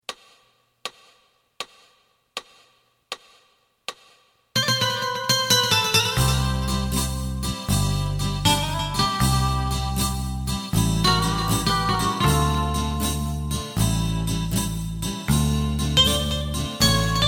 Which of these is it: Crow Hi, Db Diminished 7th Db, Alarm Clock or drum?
drum